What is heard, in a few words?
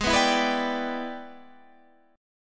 E 7th Sharp 9th G sharp